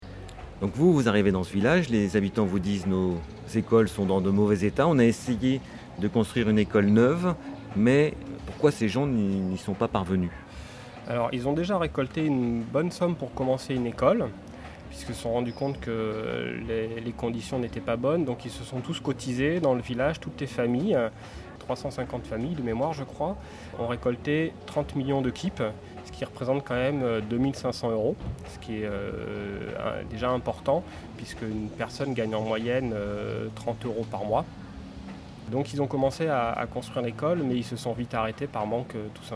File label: EDM